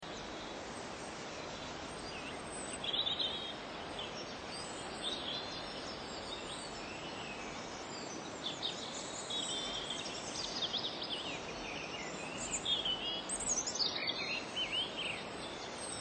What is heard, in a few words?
outdoor